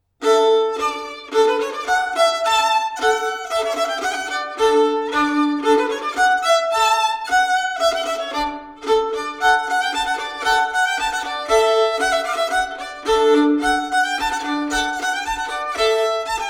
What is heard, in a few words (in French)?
Polka piquee